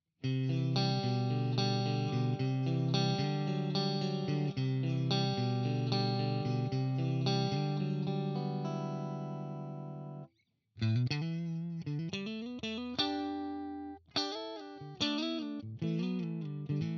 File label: Fender Am Pro neck mid CLEAN